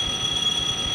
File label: Ats, Train; OpenBVE